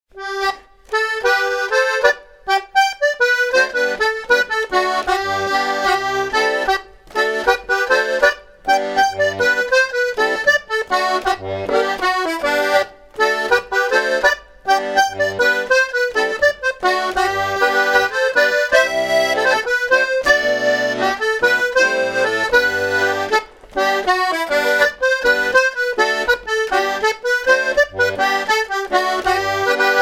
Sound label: Bobbing Around 2x Figure 2x Chorus; Morris Hey